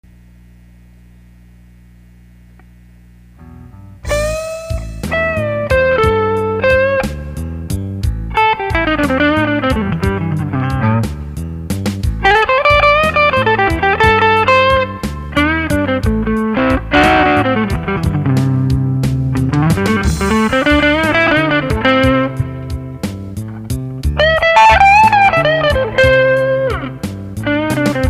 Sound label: flying v